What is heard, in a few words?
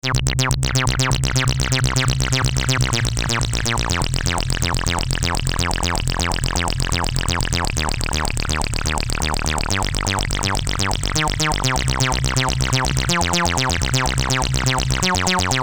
Vintage, Full of Bullets; Green Oak Crystal